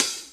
HH, HIHAT HIP; 90 s Sample CDs; Best Service Dance Mega Drums

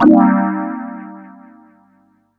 Oooh Air Eb, Drum & Bass Pads; Total Drum&Bass; 90 s Sample CDs

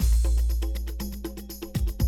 120, Classic Drum Loops

